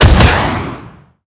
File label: Drone Missile Attacking; blue archive